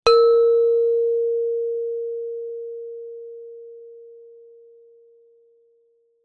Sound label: musical instrument gender gamelan single mallet hit mid low